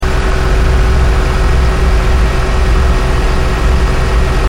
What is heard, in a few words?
tank engine loop; soundscrate